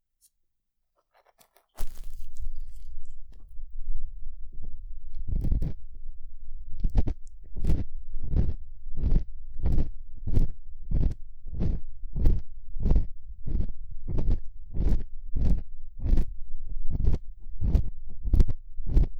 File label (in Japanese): 耳かき音; 好き勝手やった匠耳かき音